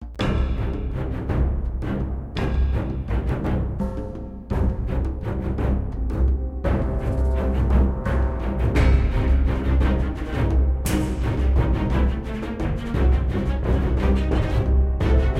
Tense Sting; jurassic park the game android ios ps3 windows xbox 360 gamerip 2011

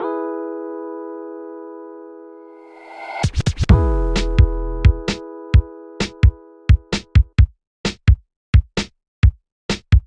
Instrumental; Friday Night Funkin Back Alley Blitz vs. Whitty ost pc mod gamerip

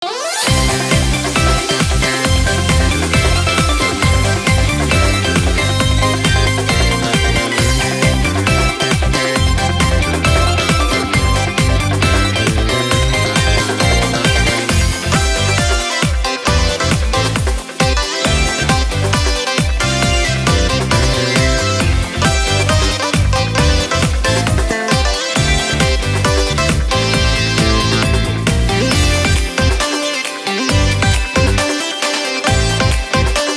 Music Event

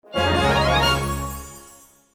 Cleared!; paper mario the thousand year door; A Waltz with TEC

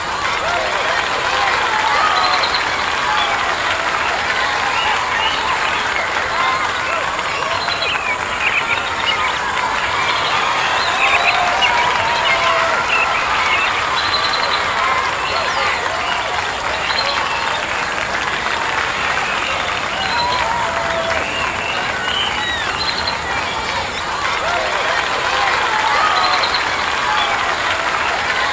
sack race amb; birthday party bash; wii

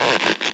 Stretch Sound Alt Short

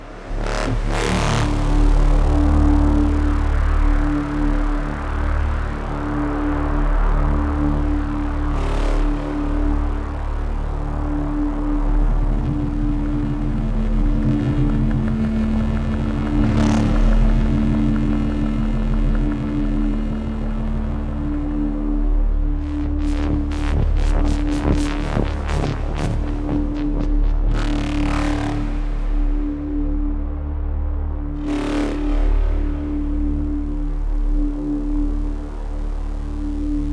Loading Screen Loop; Matterfall; ps4

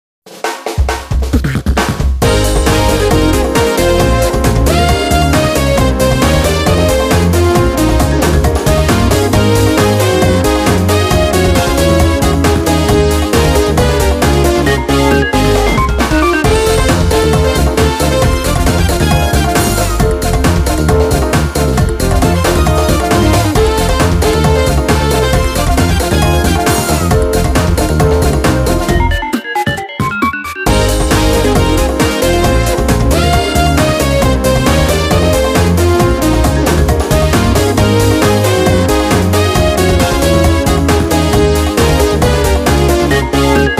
Music Menu event

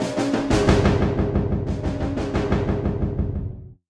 90 bpm beats; Torchlight III; PS4

